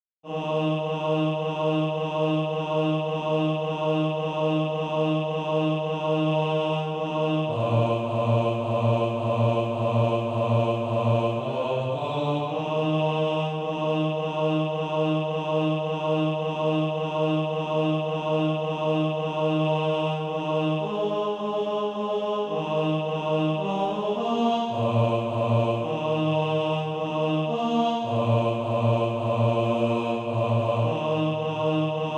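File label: bass, Practice Music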